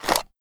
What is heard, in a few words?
mag out, weapons m82; Urban-Coalition; Anarchy; arccw ue